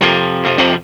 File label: guitar